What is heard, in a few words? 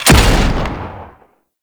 Thumper Bot Rocket Shot; Warframe; Grineer